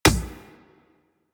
Beach Ball Floof Kick

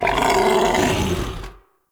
Battle Dog Death; Animals; Vocals

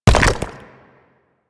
Nechro Mech Step Surface Rock; Step Surfaces

